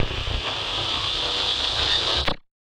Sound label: Casio, Glitched, malfunctioning synth